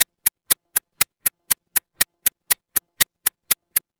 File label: mechanical clock tick